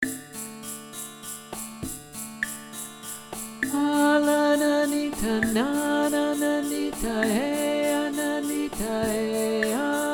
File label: Alto; A La Nanita Nana